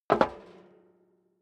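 step dress boots metal rattle single stair up, footsteps; schritte; menschen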